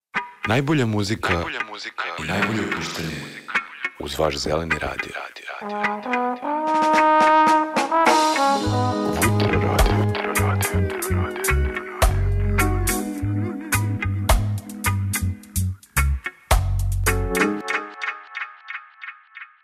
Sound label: radio jingle